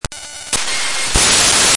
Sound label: sound interference